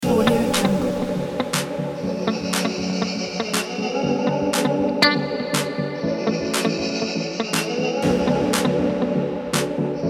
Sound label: sweet dance kit; birdsound